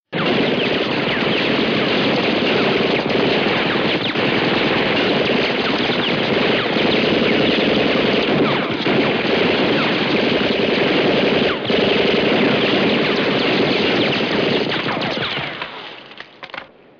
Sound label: sound effect Machine Gun